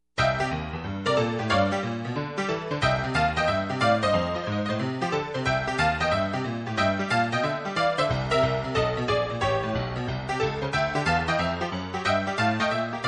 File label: Barrelhouse Piano; Album: Country Music